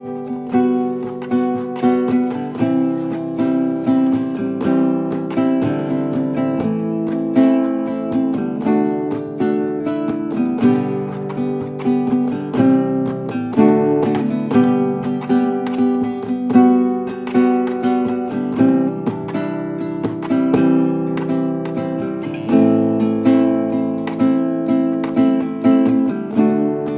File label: Rosewood guitar